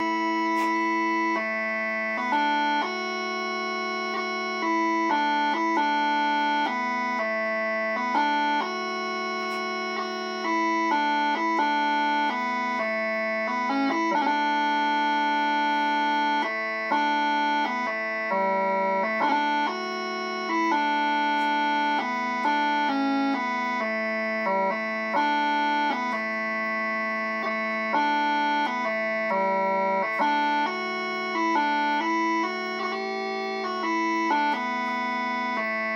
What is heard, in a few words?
Bagpipes; st patricks day